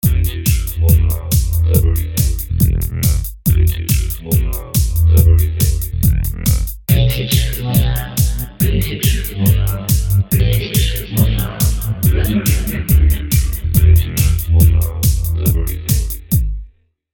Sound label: Vintage Modular